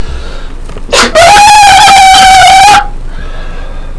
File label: screech